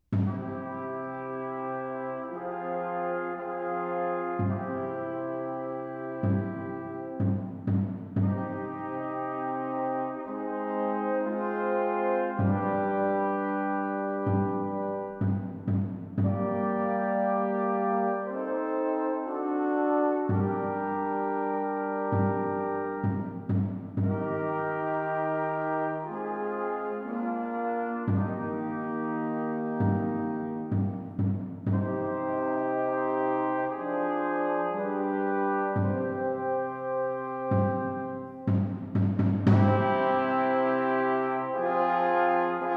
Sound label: march from music on the death of queen mary; PURCHEL; Requiem